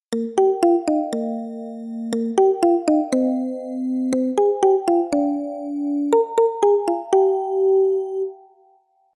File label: Jingle Bell Happy